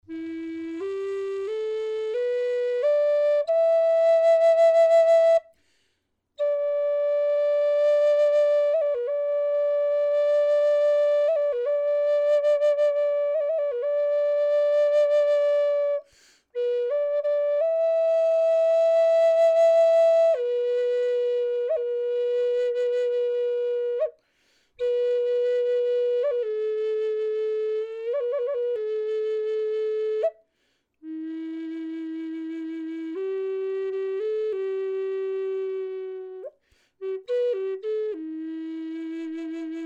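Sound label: natural, flute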